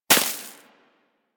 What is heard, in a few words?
Bullet Impact Debris Snow